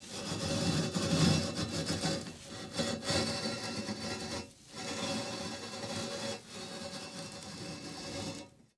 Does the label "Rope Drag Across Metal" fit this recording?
Yes